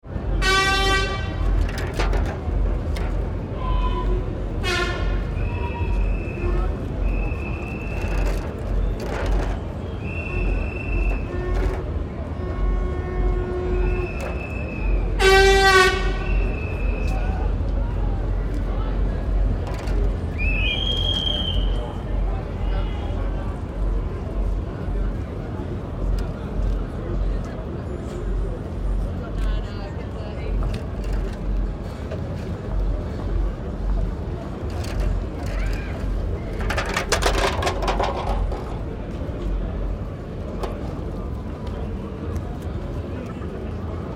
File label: Carnival Street Party 3 - Notting hill Carnival atmosphere - hooters; whistles and crowd; Parade